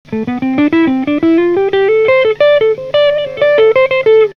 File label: Rat2 Jazz Fat, Guitar Effects Pedals; Pro Co